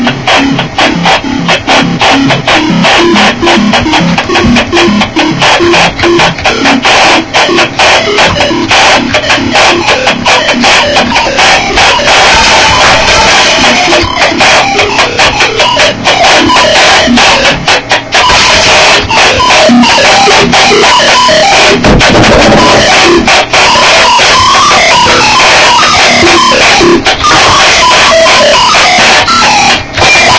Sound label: Ambient